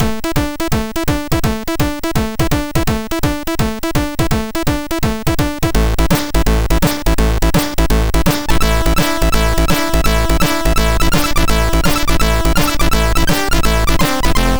MOS6581R3 sid

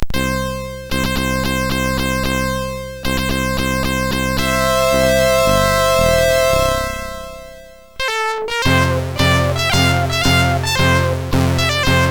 Belgian National Anthem, MOS6581R4; hvsc

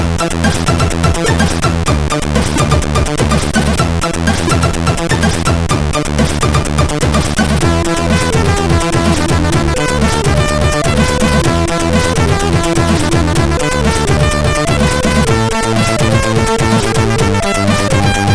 Lame Short Mix, CSG8580R5

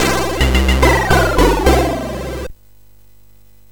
Tube, MOS6581R4

